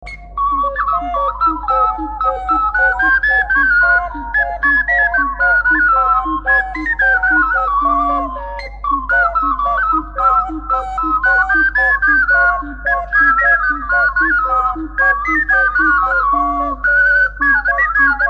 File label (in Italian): ocarine; MORETTTINA